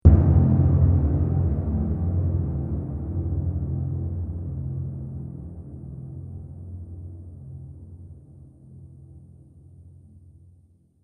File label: Drum Hit Trailer; Hit Sound Effects